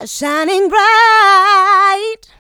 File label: Female Vocals; Houseworx